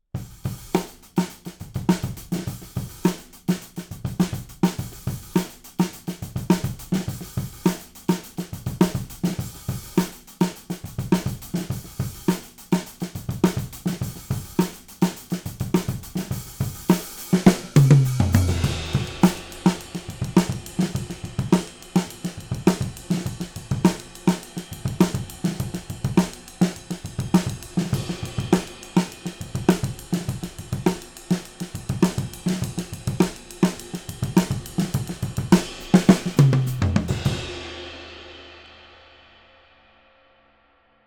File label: OVERHEADS FET